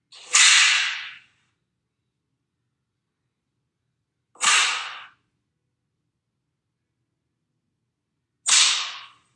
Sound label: belt smack